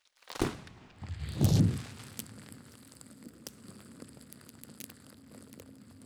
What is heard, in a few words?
Fire Ignite; adams venture origins; Airport